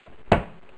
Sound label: Book Close; Imperialism